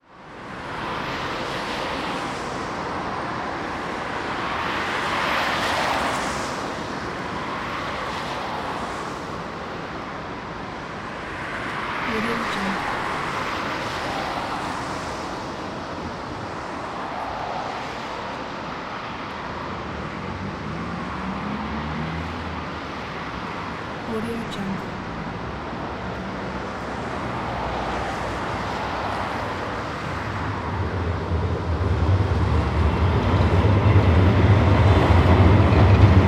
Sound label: traffic and trams after rain